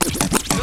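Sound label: WILD SCRATCH; Perc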